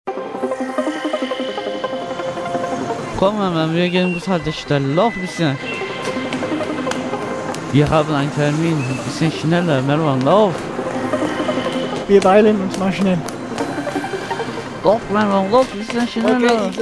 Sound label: jingle; Reise nach tue